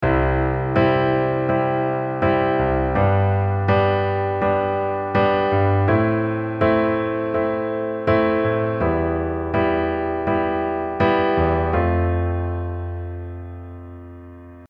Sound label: quarter note, accompaniment pattern